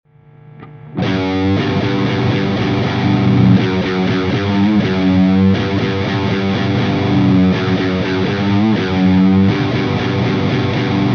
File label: HIGH plus DARK; GRAVITY SPOT